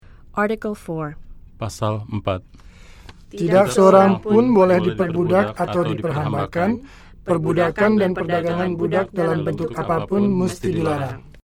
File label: Indonesian chorus